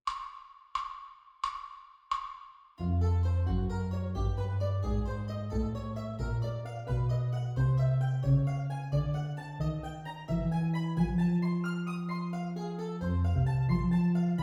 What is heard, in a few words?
Chromatic Scale Horns in Fifths